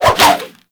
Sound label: Sword And Whip Spin Whoosh; Warframe; Weapons